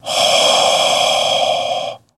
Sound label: Werewolf Breath, Airy